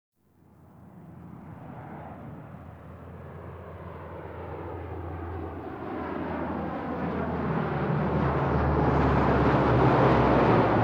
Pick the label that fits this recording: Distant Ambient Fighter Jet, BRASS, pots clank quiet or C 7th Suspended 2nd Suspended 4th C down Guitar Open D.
Distant Ambient Fighter Jet